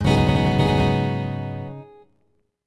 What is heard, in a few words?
guitar